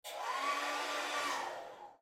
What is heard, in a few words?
Hairdryer Far